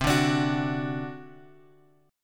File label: C Suspended 2nd Flat 5th C; Csus2b5 Chord {X 3 0 X 3 2} strummed